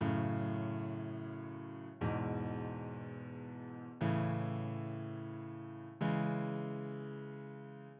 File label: f sharp chord progression basic no melody